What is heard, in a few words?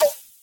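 drum snare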